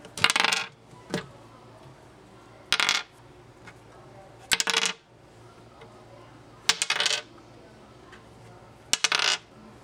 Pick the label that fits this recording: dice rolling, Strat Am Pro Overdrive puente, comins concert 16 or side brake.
dice rolling